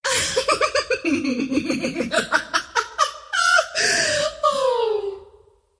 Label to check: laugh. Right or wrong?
Right